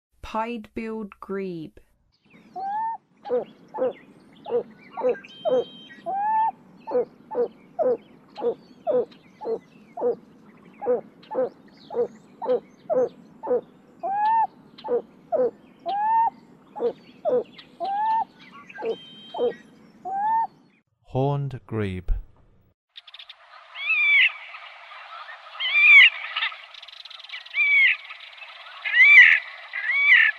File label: Pied-Billed Grebe; North America